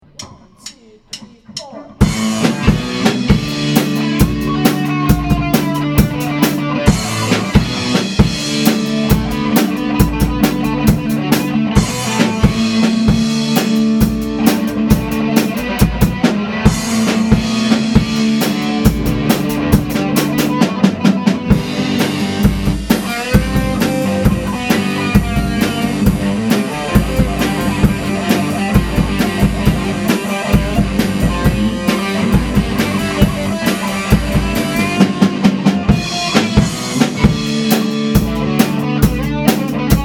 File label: Soloing Modulation; rockbandacademy